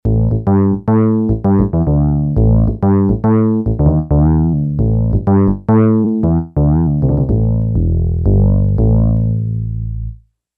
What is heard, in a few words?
E.Bass 3, Yamaha DX11; Factory Preset Voices